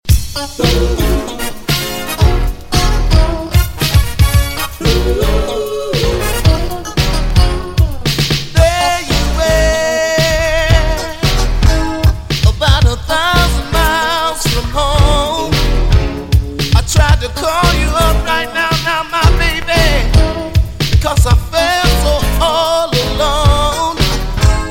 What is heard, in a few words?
modern soul boogie; SoulFunkRecords